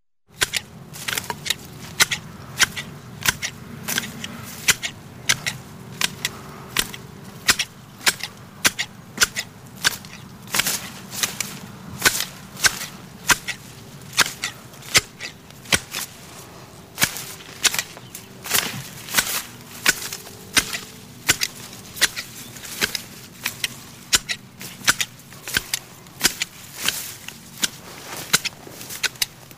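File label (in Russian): садовых ножниц подстригание кустарника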